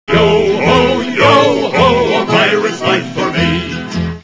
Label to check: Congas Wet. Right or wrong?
Wrong